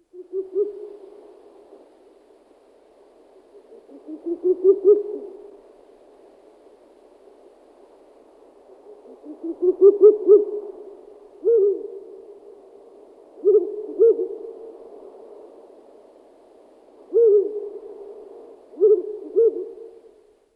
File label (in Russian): s. uralensis, golosa strigiformes